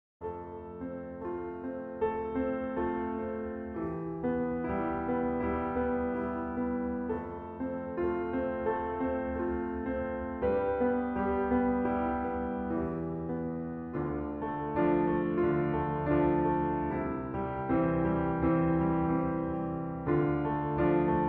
Piano Theme

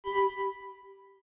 button

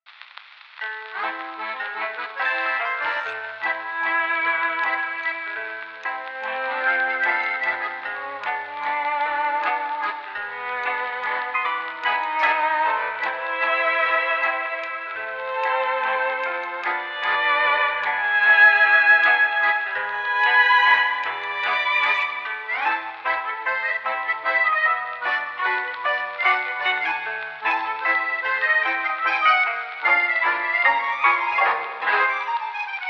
Vinyl Gypsy

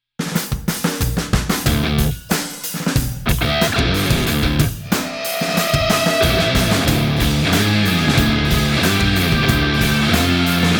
Heavy Jam

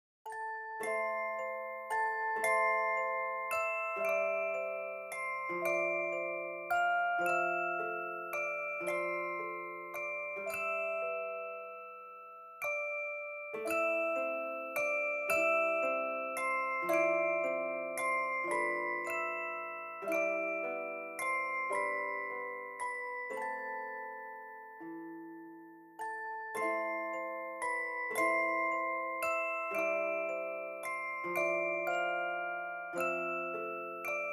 MusicBox

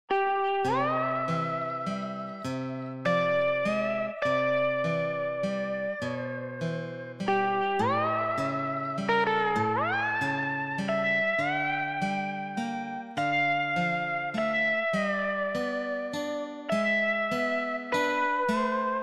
Chopin Guitar

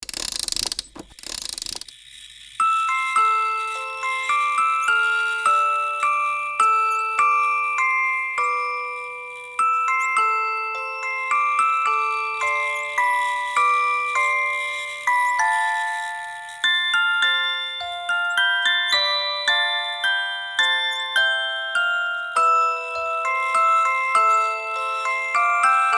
Rusty Music Box

